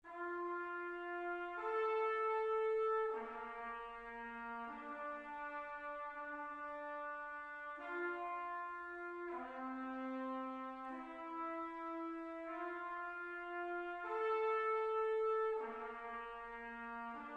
Trompette en Sib

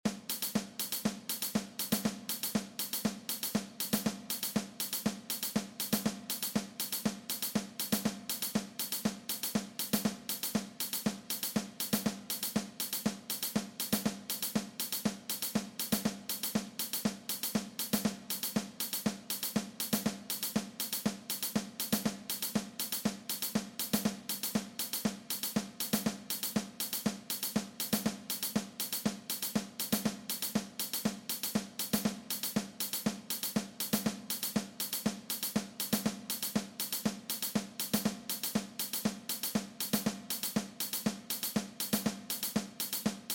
Set de batterie; Percu

